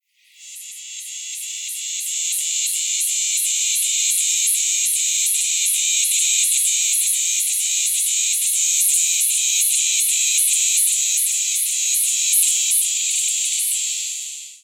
Neotibicen similaris similaris x Neotibicen similaris apalachicola hybrid, cicadas; US